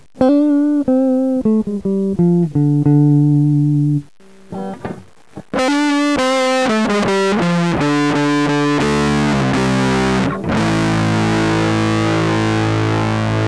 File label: Big Muff Pi